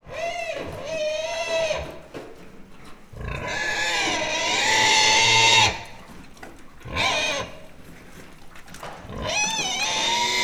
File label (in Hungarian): kan disznóra visítozó koca kecske, mangalica; miskolci zoo 2009